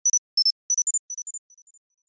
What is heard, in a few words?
Eco Trailing